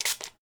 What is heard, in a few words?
SPRAY Manual; Don't Be Afraid The First Toy